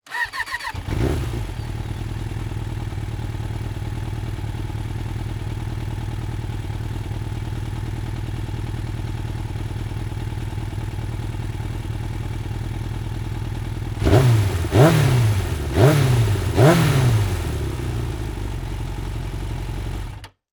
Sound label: motorcycle start and revs; transportation